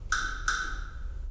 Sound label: wood block hi